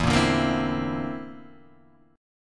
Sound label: D 7th Suspended 2nd Sharp 5th E